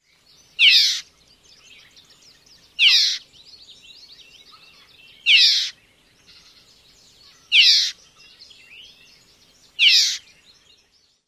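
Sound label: red-eyed vireo tchway call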